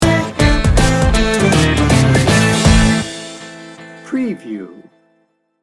sassy blue sting bumper

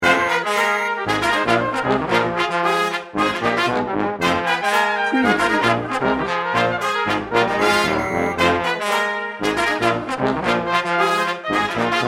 mardi gras party no drums

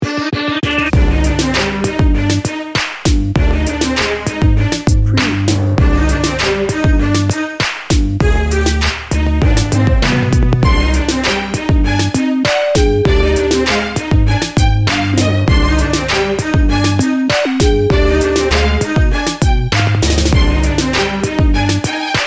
hip hop heartbreak

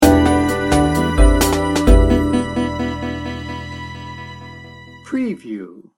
breezy and positive sting bumper

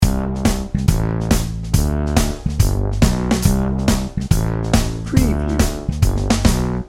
buddies dnb loop

